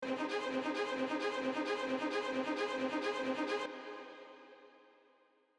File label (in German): Streicher Ostinato